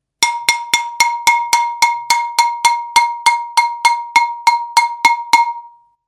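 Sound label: saucepan sound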